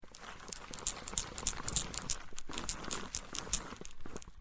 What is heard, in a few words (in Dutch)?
muziekdoos - langwerpig